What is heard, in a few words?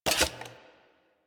Pickup Tablet; Warframe; Cephalon Weave